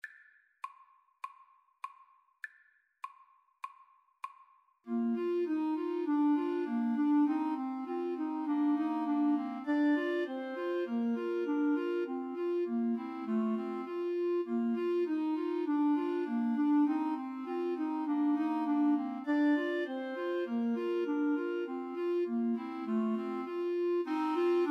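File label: schumann melody 3 clarinet karaoke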